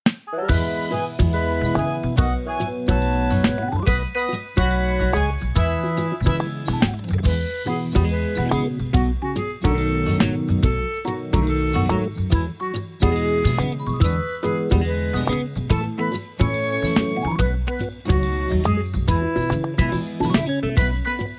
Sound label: Instrumental